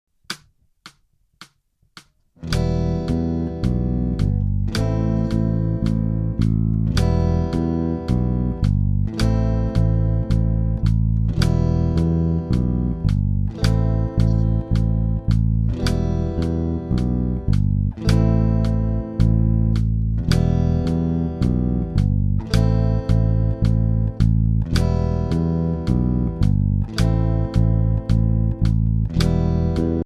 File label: Minor Trad Bass Lines, bass guitar